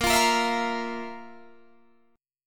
F Major 7th Suspended 4th

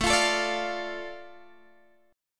A Suspended 4th Sharp 5th down Guitar Open G

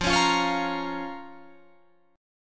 F Suspended 4th Sharp 5th F, Guitar Drop D

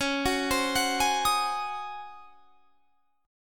Db Major 7th Suspended 2nd Suspended 4th, Db; arpeggiated

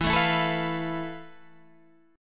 F Major 7th Flat 5th E, guitar chord

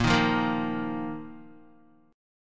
Bb Suspended 4th Bb down Guitar; Bbsus4 strummed

